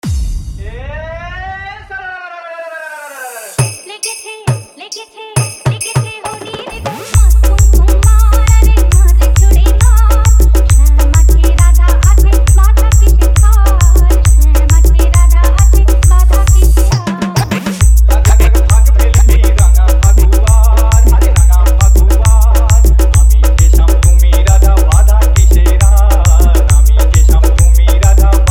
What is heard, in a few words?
Holir Dhum Holi New Style Spl Dance Humming RoadShow Mix